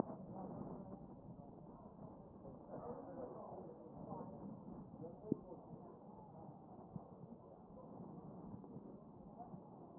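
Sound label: anterior chest, position 3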